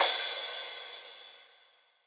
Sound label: Crash